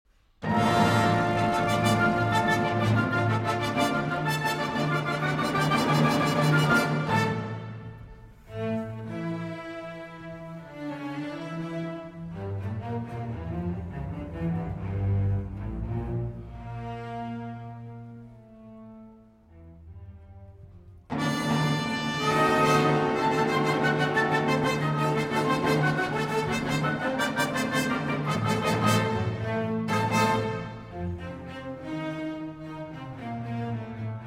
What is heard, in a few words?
Symphony in D Minor, Choral